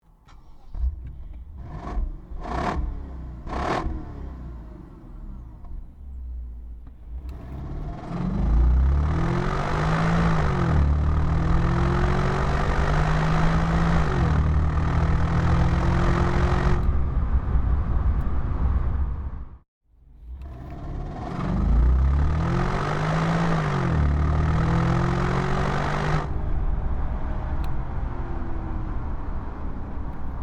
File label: BMW 750Li xDrive